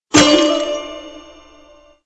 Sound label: Chinese Lantern Destroyed; fowl; MoonFestival Blocks